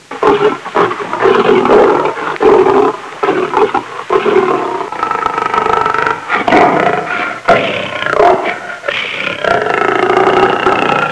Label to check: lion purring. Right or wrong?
Right